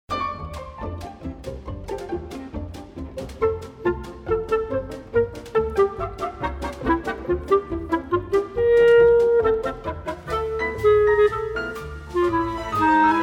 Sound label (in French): clarinette